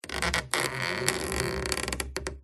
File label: Stress Creak Squeak Groan; PMSFX